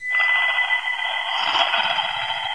crazy lady death; cof; running crazy

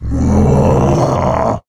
alert, male zombie; nmrih; zerosiki; slender

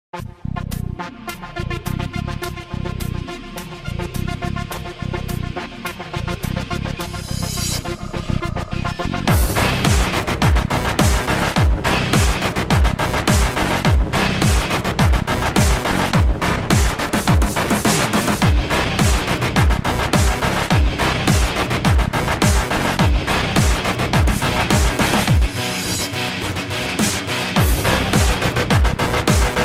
wave music; zombiesurvival; expidonsa waves

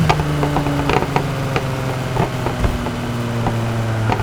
revdown; nfs heat ganimator pack

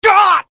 stabbed; freak fortress 2; library of ruina; roland

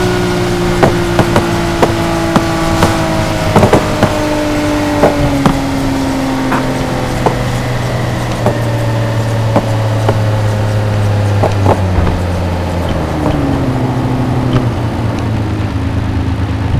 revdown; skyed; nfs heat ganimator pack

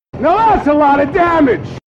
damage; freak fortress 2

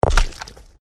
walk, footsteps

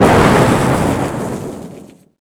storm hit sound; chiabihamaru; cv project; invading executioner; zerosiki; slender

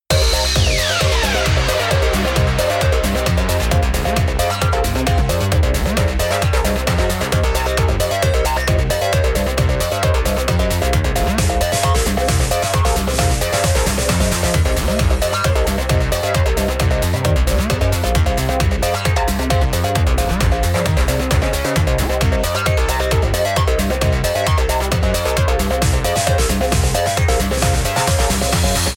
typing, music bossgame; tf2ware ultimate

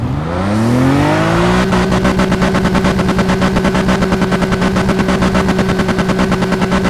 rev; skyed; nfs heat ganimator pack

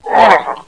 pain; cof

